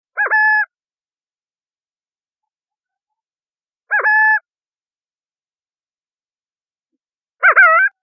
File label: burrowing, owl